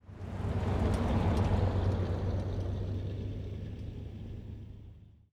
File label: amb pool; netent